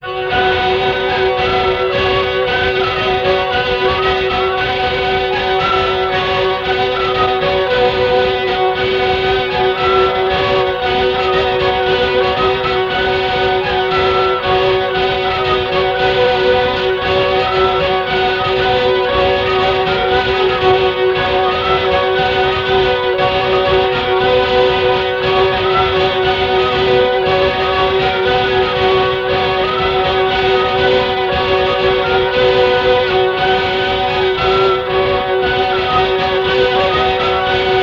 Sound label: alto; Bourrée Chaînée de Rivarennes; Altogether Nows & French Day Course - 13th September 1980; SIFD